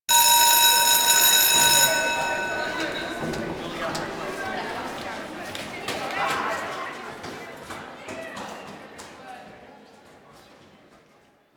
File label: Destroyed Class Room Bell; Warframe; The New War; Zariman